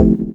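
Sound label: chord organ, Stabs, House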